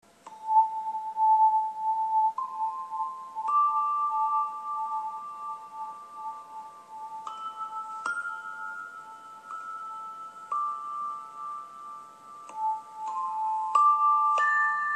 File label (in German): Schaukelzimbel